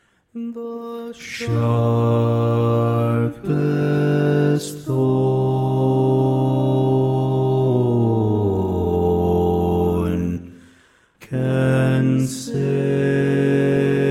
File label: Bass, barbershop tags